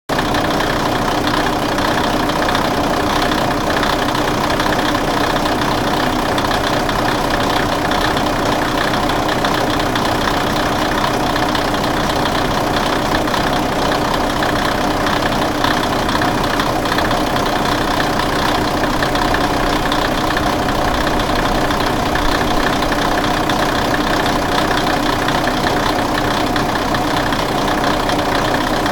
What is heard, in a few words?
idle, rail; shimatetsu